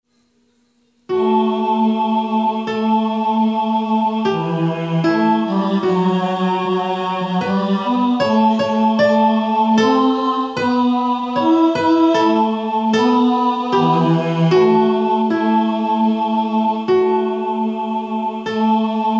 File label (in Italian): voce tenori